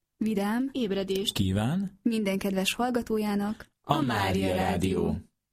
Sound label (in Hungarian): Reggeli J